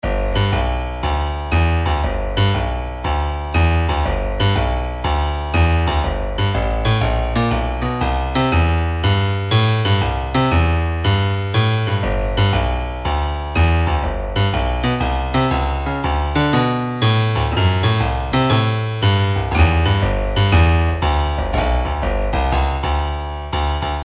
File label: piano blues ringtone